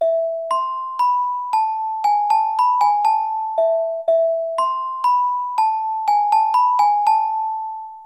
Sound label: Celesta; Smeshariki Chiko's Computer